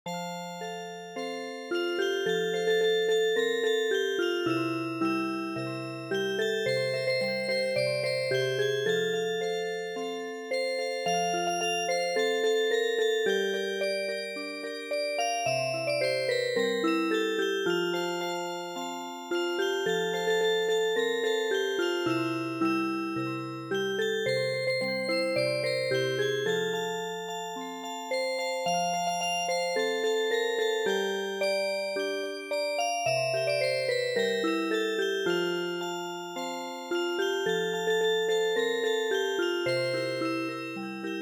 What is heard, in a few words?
Music Box, midi